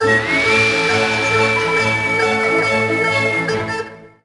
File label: Sting Results Level Up